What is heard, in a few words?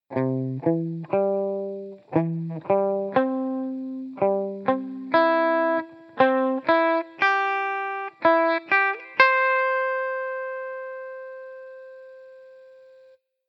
scale example